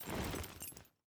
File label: rattle; gmod; arccw uc commons attachments